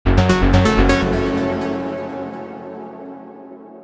Lead Melodic Techno Vital

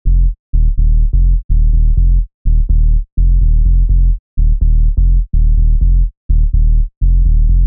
Bass Loops Techno House

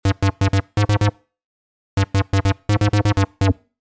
Lead Serum Techno House

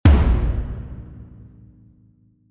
Big Drums

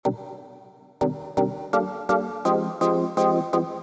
Techno House; Spire

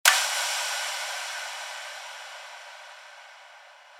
Downlifter

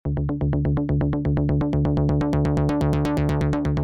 Bass Melodic Techno Spire

